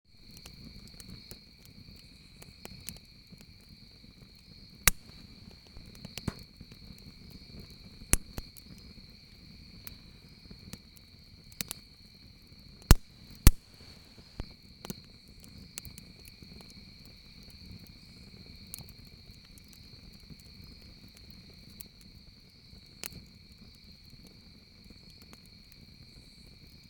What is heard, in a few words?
Camp Fire